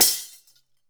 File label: DAF HIT; WorldSounds